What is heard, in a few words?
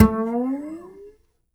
Upright Acoustic Bass